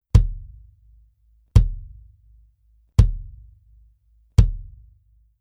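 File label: BASS Clr Bury Beater, bass drum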